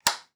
switch, Train; OpenBVE; Cl313 Silverlink

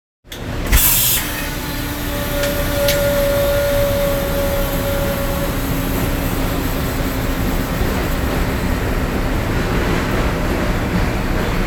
Charge, Train, R62 Kawasaki; OpenBVE

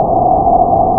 Run, Train; OpenBVE

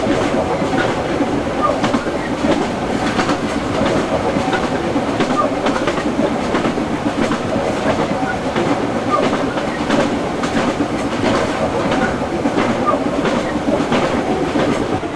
Run, Train; OpenBVE; Cl313 Silverlink

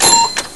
Ding, Train; Z5300; OpenBVE

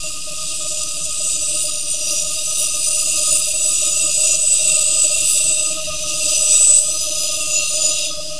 flange, Train; OpenBVE

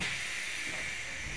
Door Open, Train; H-Start 426 Siemens Desiro

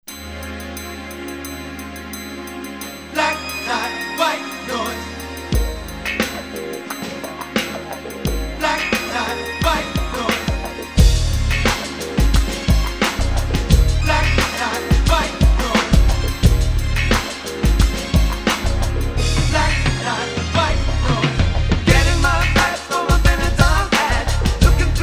Radio Mix

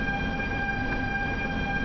Motor, Train; OpenBVE

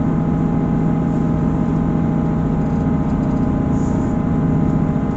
motor, Train cl158; OpenBVE

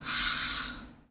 Air High, Train; OpenBVE

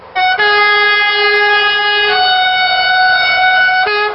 Klaxon, train; OpenBVE

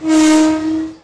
klaxon, Train; OpenBVE; 103KCJ-LowerCab

